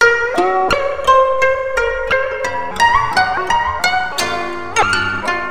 KOTO LP FX, KOTO FXLP; USB Soundscan vol.60; AKAI

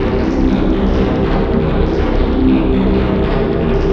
Wet Riff; SFX & One-Shots

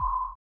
SONAR R; Spectrasonics; Metamorphosis

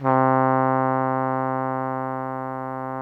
BRASS 2 C2, ORCH BRASS; Greytsounds VOL-1

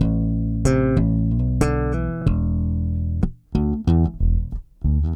bass, analog funk

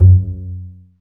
STR CB JZ, Cb JazzPizz; Roland - LA Composer vol. 1

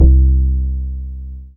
BAS FM DX-7

